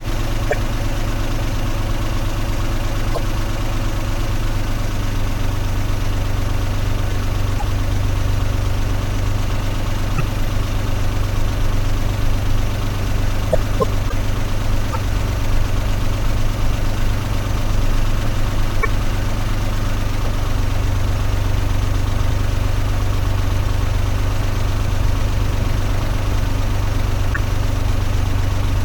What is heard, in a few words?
CAR; pictcode